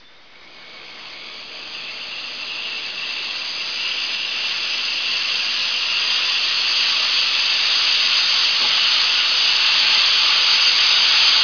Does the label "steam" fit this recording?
Yes